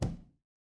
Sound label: impact punch